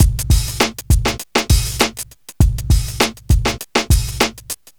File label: Street Tip, R&B Drums